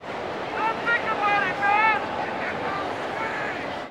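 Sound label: baseball crowd ambience chatter; SFX Kit; Crowds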